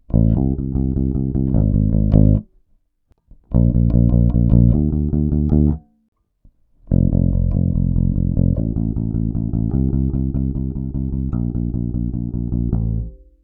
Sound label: midnight bass samples